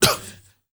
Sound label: MALE RAV, MALE NOISE, Heart of Africa